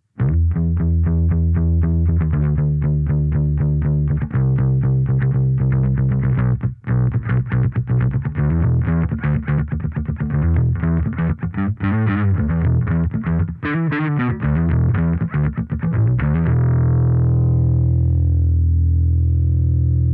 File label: Rock DI